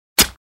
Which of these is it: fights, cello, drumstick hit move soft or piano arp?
fights